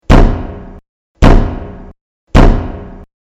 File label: Knock